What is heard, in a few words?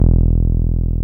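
BAS 303 Bass 7.1, Best Service Gigapack 2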